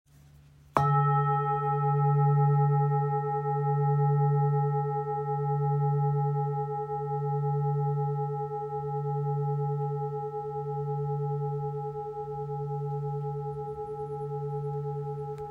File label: Polished bowl, singing bowl; Polished Harmony Bowls; 25.5cm